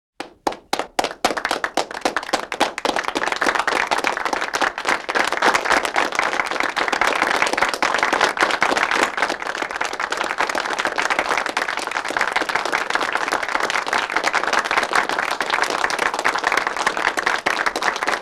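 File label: small crowd applause; Asian Show Sound Effects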